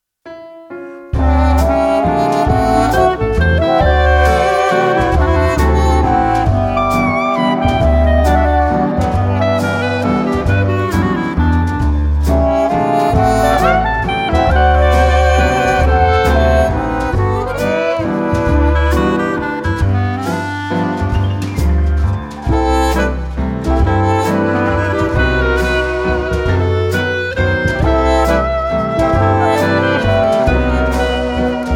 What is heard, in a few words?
Waltz